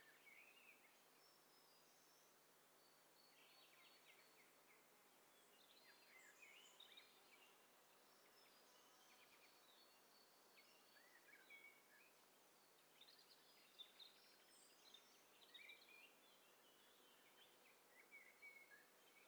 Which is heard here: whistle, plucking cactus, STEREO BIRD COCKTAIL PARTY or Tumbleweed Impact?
STEREO BIRD COCKTAIL PARTY